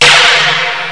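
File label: cannon; IO CD 1999 April; DARKMOON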